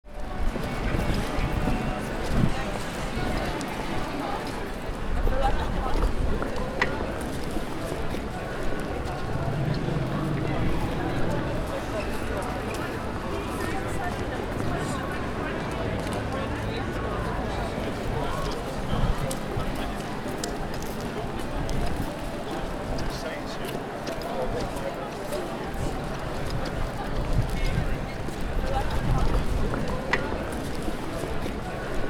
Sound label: City ambience light traffic pedestrians and footsteps